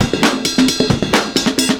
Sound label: PERCS, 70 s Breakbeats